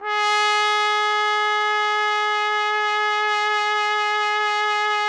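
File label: TPT, trumpet; Roland - LA Composer vol. 3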